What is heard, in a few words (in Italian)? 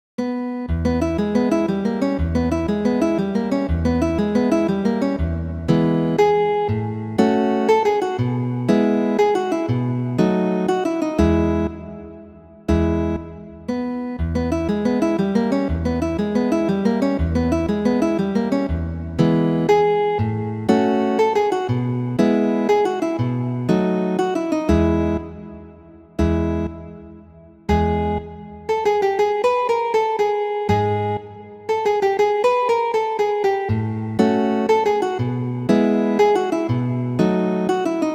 valses, guitar